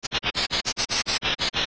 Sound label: Shock; Link; zelda; OOT